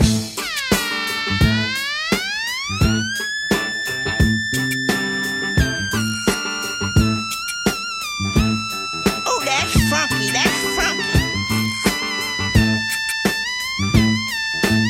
FUNK

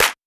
clap one shot crunchy; Claps

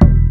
Hit

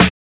Snares

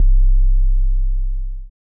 808 LOW

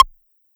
Synth

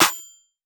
Clap; Lex Clap

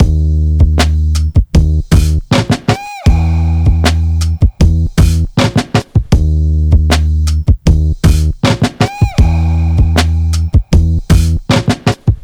DRUM LOOP

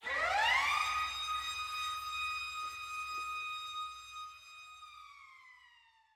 Siren

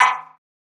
Perc

